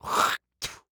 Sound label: Human Male Spitting